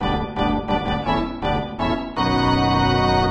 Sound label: Ditty; All Star Baseball 97 Featuring Frank Thomas; PS1; Saturn